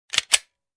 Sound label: Reloading pistol